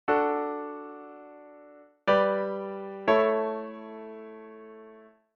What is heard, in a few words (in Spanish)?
Semicadencia Iv a V, D; auditivo de cadencias autenticas plagales rotas y semicadencias; Identificar la cadencia